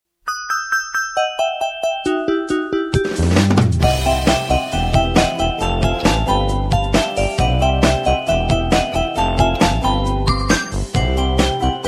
Piano; Mario

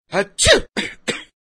Sneezing Sms

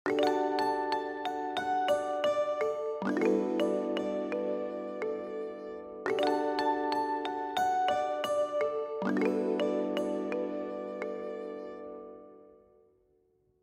Peaceful Alarm

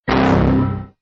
Pc Error Sms

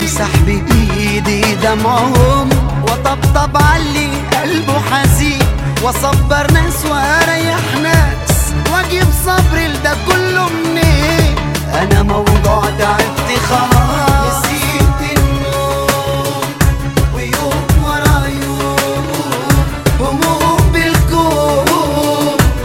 Sad Arabic Song

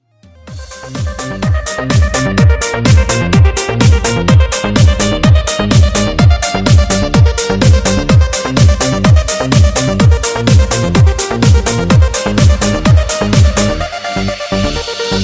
Electronica Cool

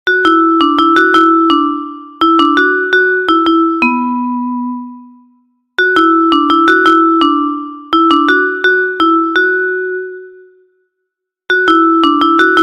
Vibra

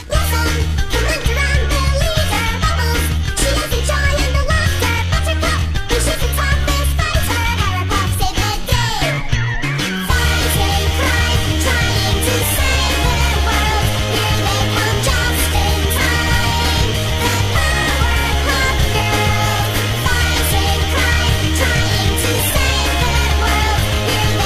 Nightcore; PPG

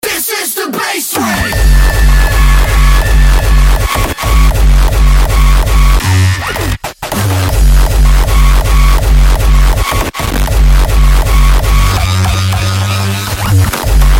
Basstrain

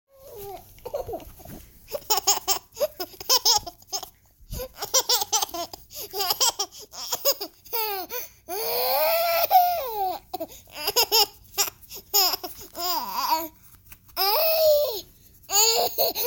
laughing

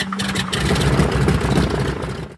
plane engine start

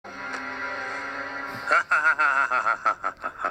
Evil Laugh

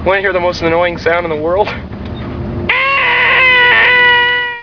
Most Annoying Sound

